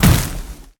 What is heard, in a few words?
impact fire; smite; god merlin